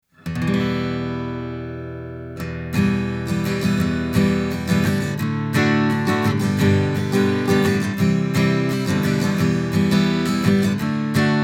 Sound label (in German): A Git Strum Beyer201